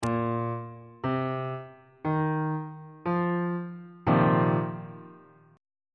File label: e sharp dominant 7th suspended 4th 1st inversion on bass clef